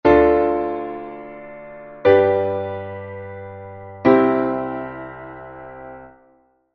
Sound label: triad 2-5-1